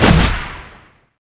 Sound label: power shot